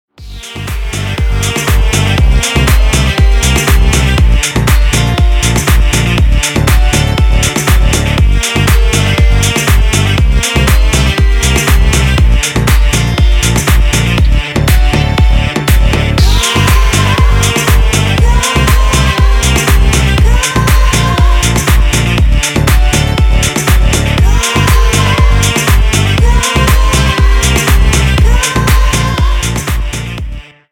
remix

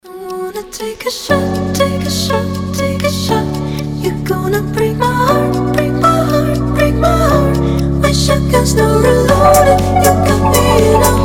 organ

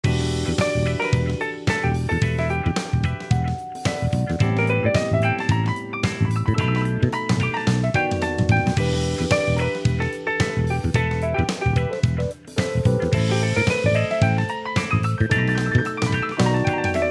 blinking; zuk

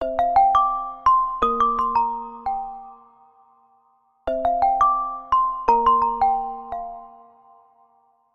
sparkle; ubuntu touch

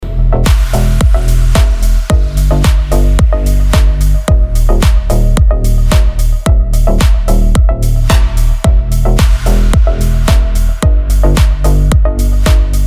electronic music

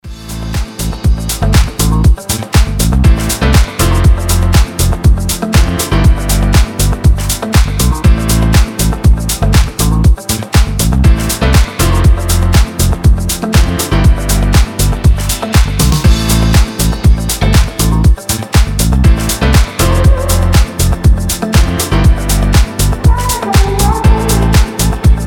hard rock

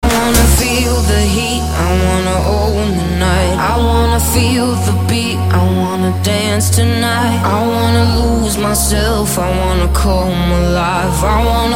overdrive

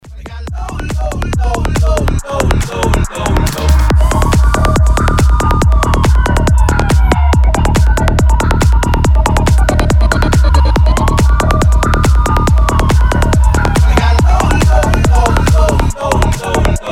psytrance

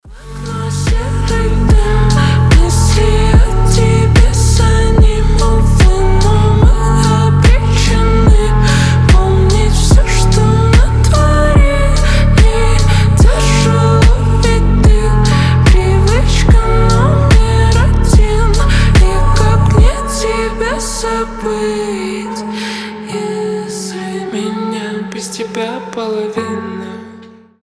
slowed

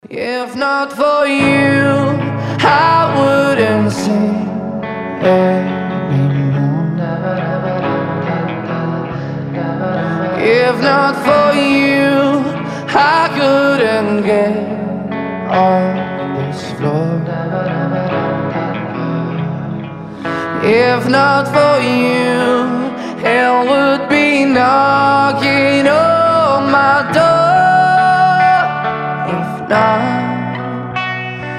soft rock love ballad

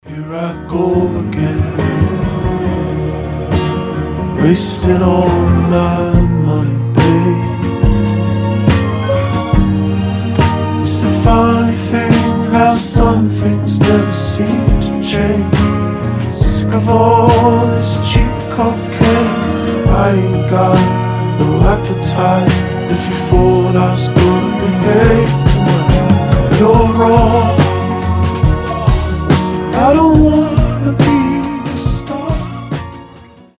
slowed

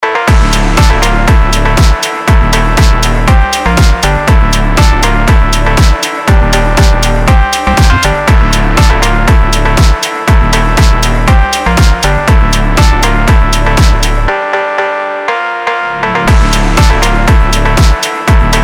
instrumental

